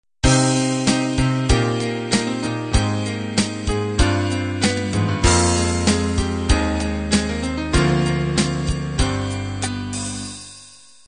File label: rock ballad intro